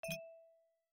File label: Chip Landing; my cute commissar 2020 gamerip